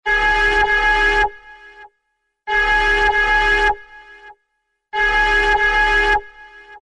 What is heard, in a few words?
alarm space station high